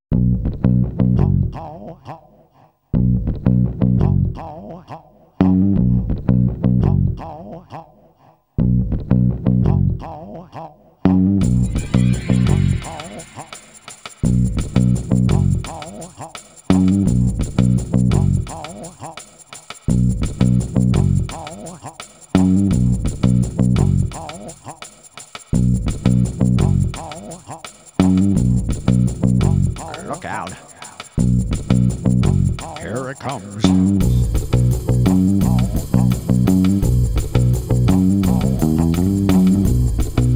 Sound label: Animal Drums & Animal Bass mix